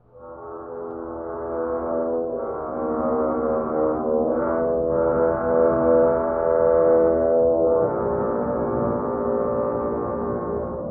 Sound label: slow creeping terror